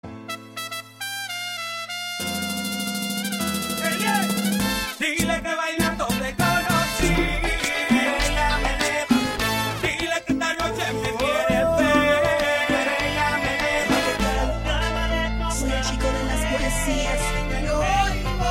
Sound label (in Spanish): Mix reggaeton viejo